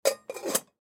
Teapot Metal Close, Teapot Metal Close Lid Antique Tea; Tea Kettle; Household; Dishware